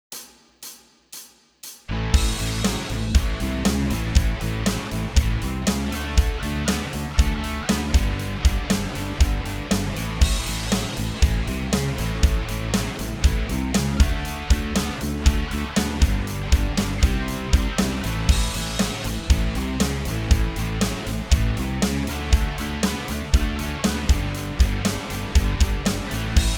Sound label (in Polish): bron solo podklad